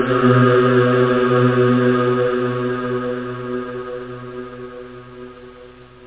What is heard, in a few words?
choral, synth; da capo vol1; instruments